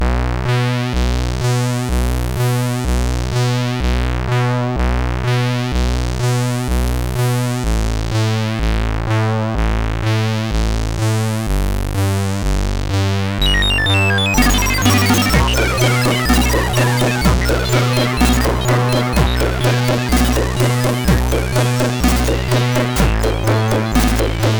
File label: Rulla Runt, MOS6581R3; hvsc